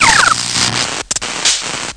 launch URL sound; MacAddict

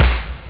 rock, drums snare; OctaMED SoundStudio V1

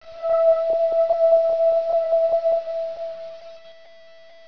high tone

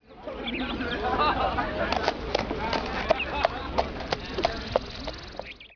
city, Ambient; ZEUS

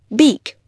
beak

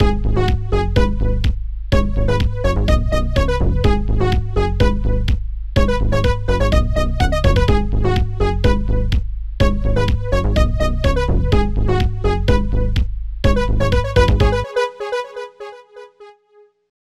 main music; cribbage

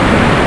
missile; Delta Force 2

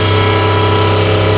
reverb; CARS; pcgamervan

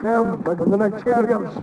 walla; DAoC

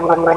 short gargle; Sound Library 2000; Wayzata Technology; People

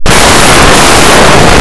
door; Blue Heat

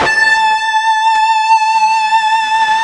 fuzz A4

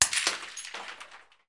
Smash

